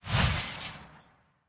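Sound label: bullet hit building; apache; War Thunder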